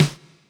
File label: snare; stan kit 2; yamaha reface cs